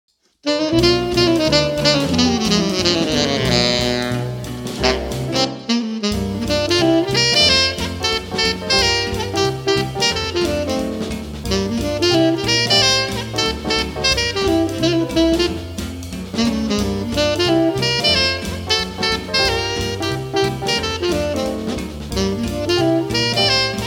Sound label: Tenor Sax